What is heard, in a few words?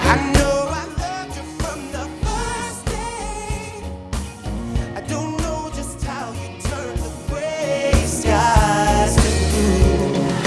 Music; VersesAndFlow; Smollette